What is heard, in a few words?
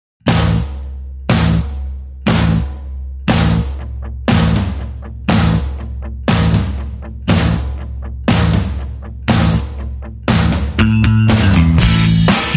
Guitar Star, Short Rhythm